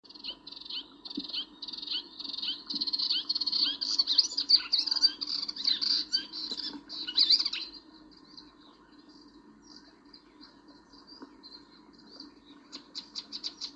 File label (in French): Hypolais polyglotte